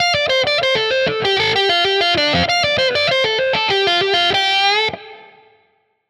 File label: Guitar Line, hiphop; 85bpm; musicradar